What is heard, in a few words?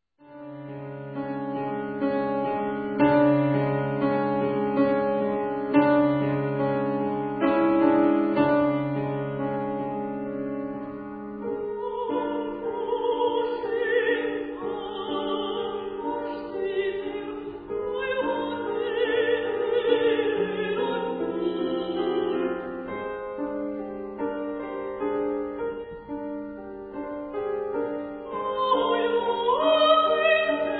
lullaby